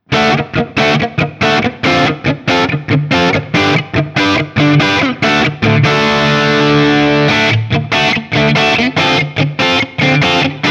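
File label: Guild X97V JCM800 D, guitar; 1983 Guild X97V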